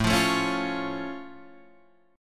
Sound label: A Augmented A down